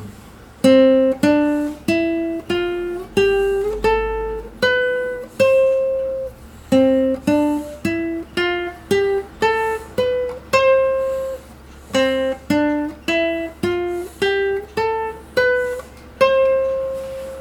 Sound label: c major scale, classical guitar